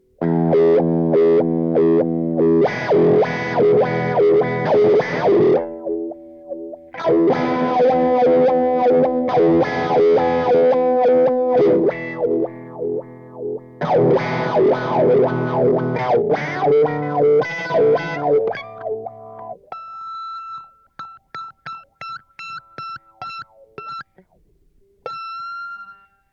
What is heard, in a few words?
riff